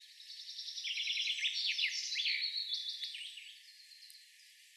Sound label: birds 1shot; animal